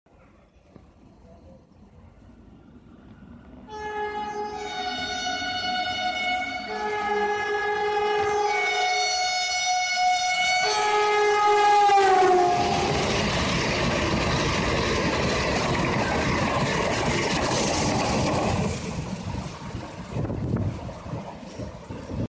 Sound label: Renfe Alvia big horn